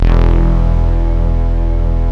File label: KORG E2, synthesizer; Hollywood Edge; Giorgio Moroder Rare Synthesizer Collection